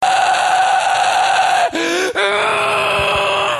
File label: Argh Urgh